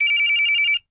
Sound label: Cell Phone Ring; 1001 Sound Effects